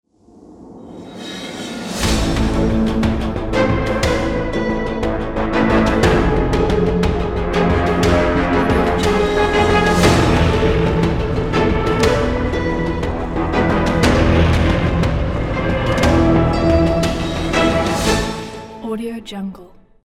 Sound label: dark cinematic action trailer logo